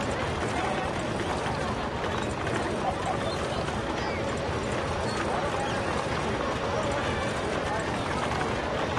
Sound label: Circus Ambience; Pig City Circus Ambience